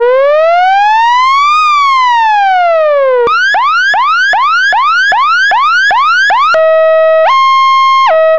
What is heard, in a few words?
emergency alert siren